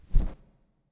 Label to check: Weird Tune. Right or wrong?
Wrong